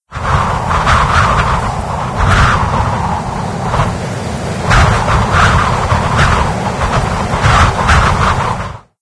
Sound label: mono gust; video games; Left 4 Dead 2; Source Engine; ambient; rainscapes